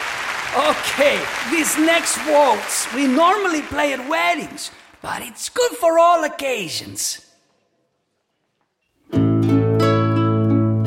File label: Wedding Waltz; Desperados 3